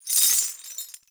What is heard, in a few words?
Movement Dash Chains; Warframe; Uriel